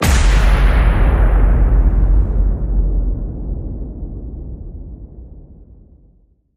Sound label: hit big copper and chrome